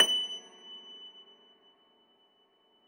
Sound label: Thumb Tack Piano ff ped; Total Piano